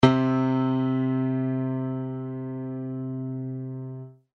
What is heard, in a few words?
compress ok